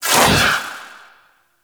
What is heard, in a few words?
Doom Sword Whoosh; Excalibur